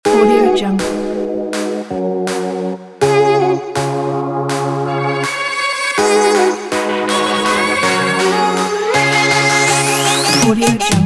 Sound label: future hiphop bass